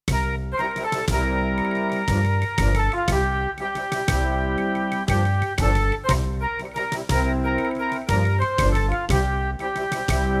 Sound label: CM500 GS; LSL3